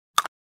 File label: fights